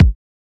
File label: Kicks